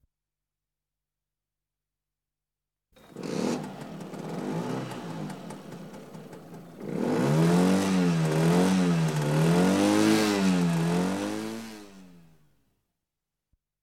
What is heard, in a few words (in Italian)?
Lambretta Partenza